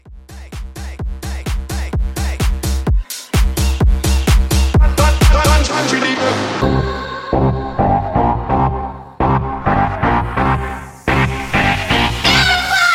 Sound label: Bumba Bass Edit